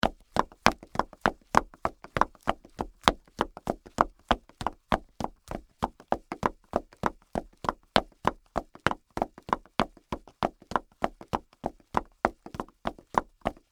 Pumps Run, Footsteps, Peep, Toe, Pumps, Run, Concrete; BlastWave